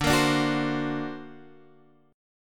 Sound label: D Augmented 7th down Guitar Half Step Down